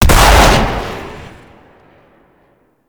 Pump Shotgun Alt Shot A; Weapons; Warframe; Zariman

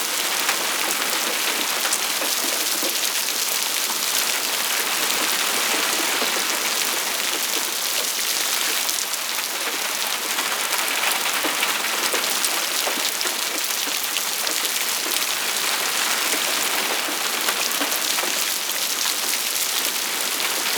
Shipyards Rain Loop; Exterior; Warframe; Grineer Shipyards

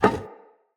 Vase Medium Impact; Vases

